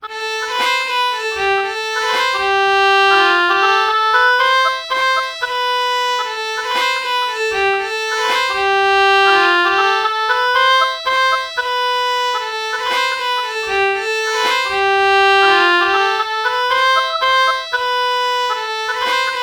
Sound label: oboe violin melody morph